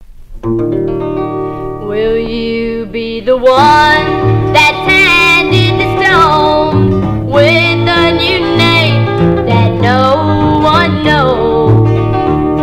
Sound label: Harp; Gary's Bangers Volume 4